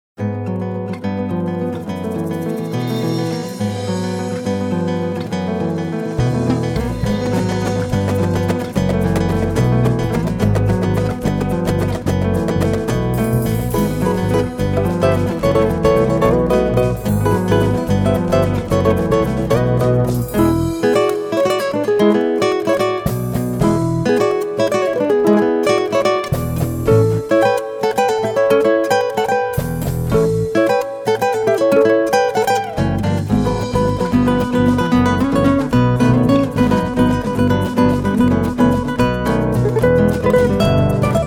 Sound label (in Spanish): guitarras